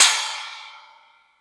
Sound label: Metal Drums, E-MU Pro-Cussion; drum machine